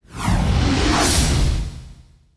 Skill Crosscounter

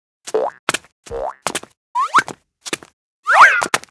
Fox Action

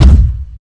Walk; Seer; GamigoNA

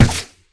Multi Shot

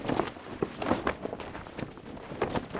Flag; Seer; GamigoNA

